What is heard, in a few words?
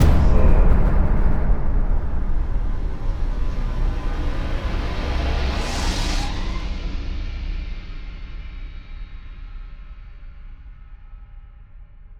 Cinematic Metal Impacts; STING; the dear friend; boom library